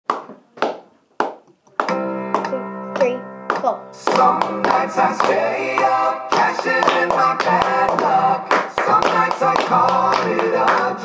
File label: clapping piece